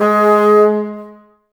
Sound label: Bassoons G#3; Miroslav Vitous - Woodwind Brass Ensemble; Bassoons Links